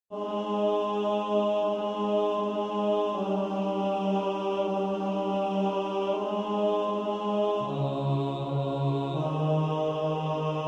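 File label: bass, practice music; Praise ye the triune God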